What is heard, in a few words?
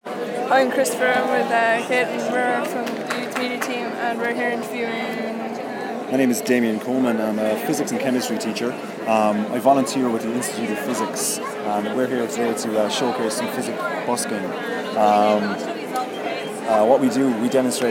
busking; learning about physics; feilte2015